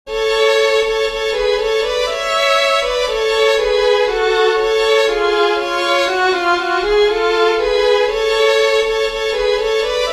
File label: UKRAINIAN NATIONAL ANTHEM harmony, fiddlers